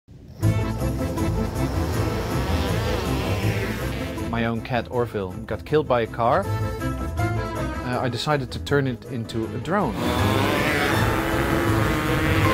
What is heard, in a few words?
drone